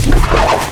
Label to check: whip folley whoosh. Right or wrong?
Right